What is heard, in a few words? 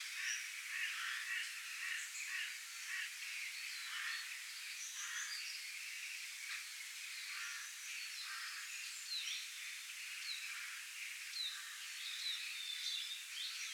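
Ambience Birds-Rain Loop; Physicist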